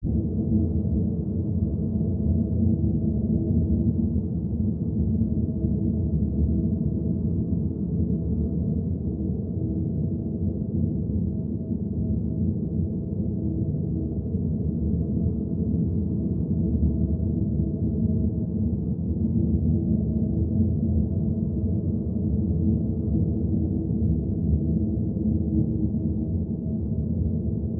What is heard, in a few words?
Ambiance Light Bass; drones & tones